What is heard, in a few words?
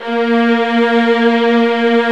A#2, Arco Violas